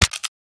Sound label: Sci Fi Pistol Underlay Death